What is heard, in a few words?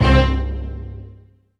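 E-Mu Proteus FX Orchestra Hit C2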